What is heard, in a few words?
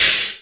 HH, HIHAT HIP; Best Service Dance Mega Drums